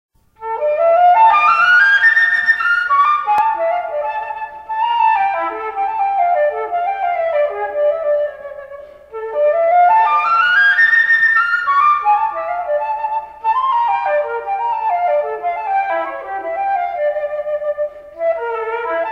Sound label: flute; ILMEA